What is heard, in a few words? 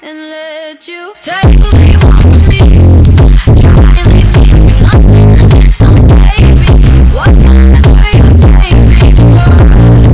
theme bass boosted